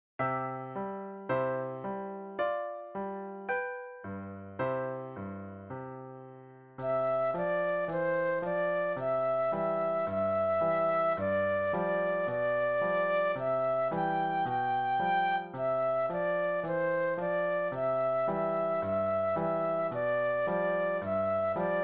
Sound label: Flute Solo